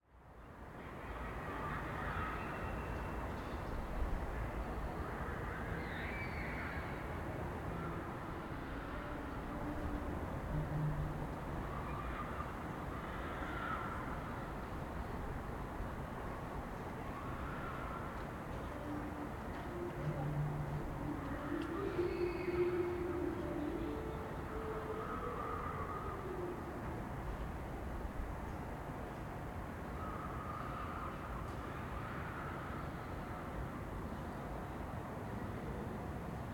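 Urban Ambience Berlin Balcony Late Afternoon Walla Ambulance Public Transport Church Bells; Bolt; Berlin Vignettes - Ambiences and Sonic Curiosities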